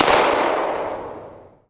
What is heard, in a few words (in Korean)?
[Hit] 총성; 音MAD; 少女终末旅行; 소녀 종말 여행 음원팩